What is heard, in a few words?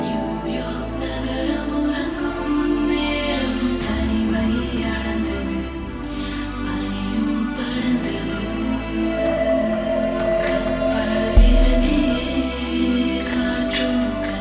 Female Chant, BGM Ringtones; Sillunu Oru Kadhal; Tamil